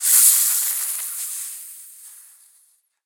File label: snake death; Smite; Baron Samedi